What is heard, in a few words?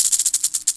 rattle, headcrab poison; npc